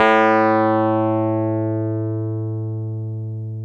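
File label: piano, Wurly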